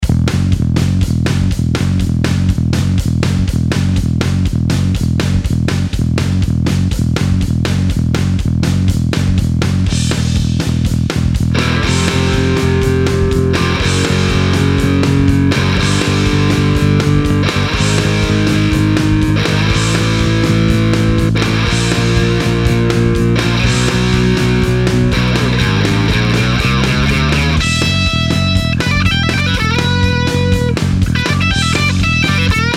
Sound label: backing guitar